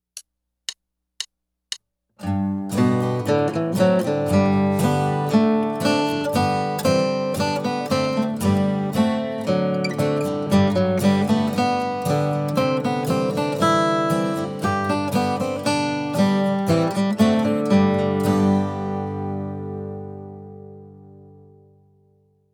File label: Two Four Picking